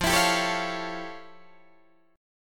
F Augmented Major 9th, Guitar, Open D

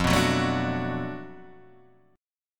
B Minor 7th F, chord; Bm7/F; strummed; JGuitar

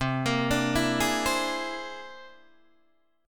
C Augmented 9th, Guitar, Open D

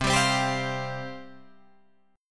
C Suspended 4th C, Guitar Open F; Csus4 strummed; 12,14,12,14,15,12

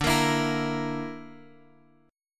D Suspended 2nd Sharp 5th D Guitar Open F; 14,17,16,17,x,x; strummed

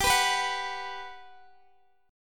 G Major 7th, G, down, Guitar, DADGAD

